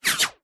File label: bullets whizz by